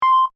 Beep Button Chime, Scifi, Beep, Button, Chime, Science, Fiction; Tech; BlastWave